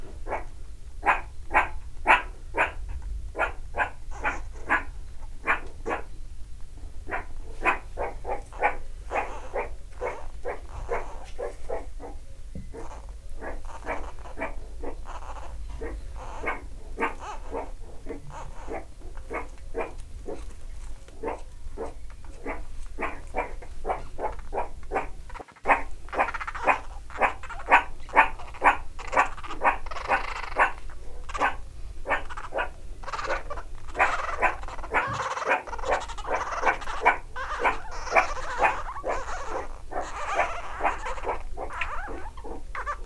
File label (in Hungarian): kölykeit védő, szurikáta; miskolci zoo 2009